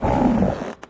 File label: strider leg stretch; scmod; synthcrab